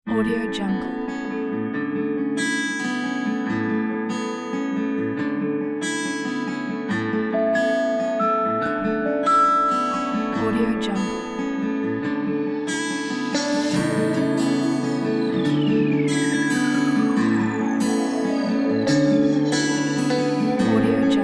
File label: warm beaches